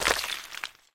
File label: Figure Drop; MiSide